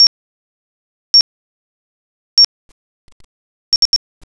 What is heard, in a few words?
timer; tfc; timerbag